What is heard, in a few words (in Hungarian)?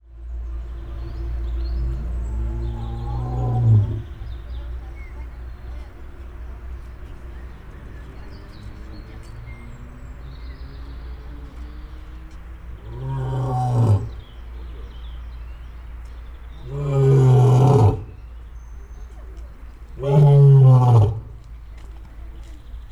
hím nőstények hím, oroszlán; állathangok; nyíregyházi zoo 2010